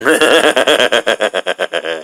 hehehehehe

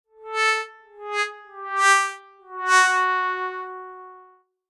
very very sad music

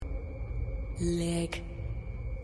lick

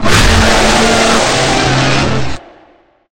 screamer; withered golden leopold

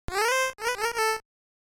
8 bit witch laugh

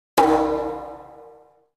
drum

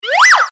flop

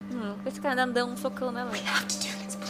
choke

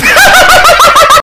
ar ar ar laugh

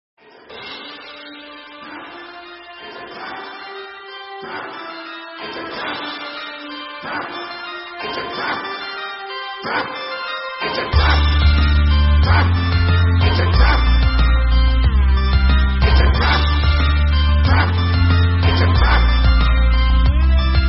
trap remix; star wars

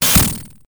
slam; sonic the hedgehog

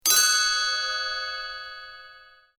chime

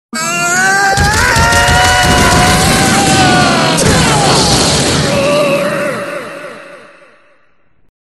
punch; darkspine sonic

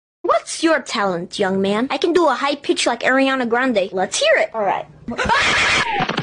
high pitch

